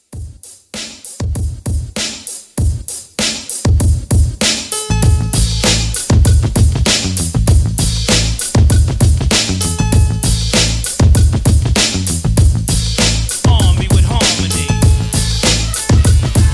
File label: 90s Re Drum 98